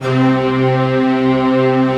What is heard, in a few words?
fast strings, Fast & Slow; Optical Media International; Sonic Images Library